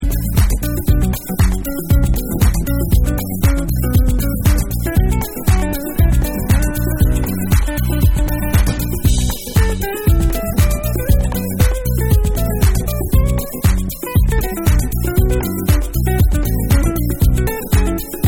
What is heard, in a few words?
Rhythm Guitar